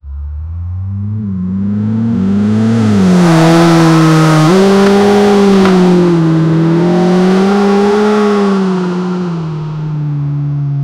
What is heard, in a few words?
create a sound of a, supercar